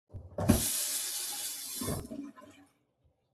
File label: water faucet